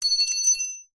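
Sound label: industrial aluminium key drop concrete floor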